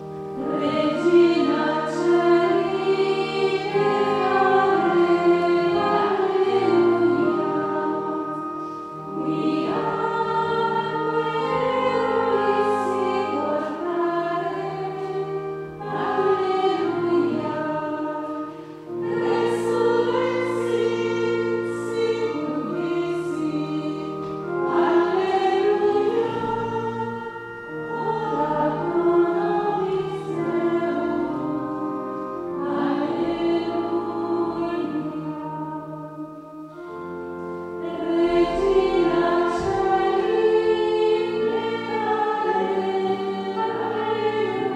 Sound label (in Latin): Regina Coeli gregoriano